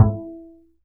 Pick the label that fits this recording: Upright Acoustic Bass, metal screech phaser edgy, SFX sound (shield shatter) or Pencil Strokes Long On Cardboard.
Upright Acoustic Bass